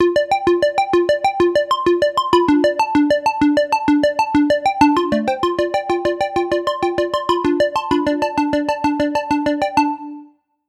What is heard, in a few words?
arp squares